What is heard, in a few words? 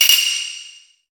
Beat tree short